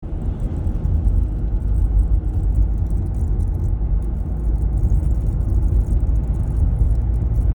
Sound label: defender noise; 2020 defender